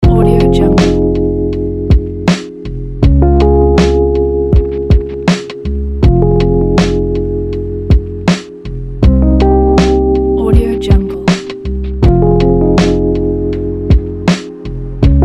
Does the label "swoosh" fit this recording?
No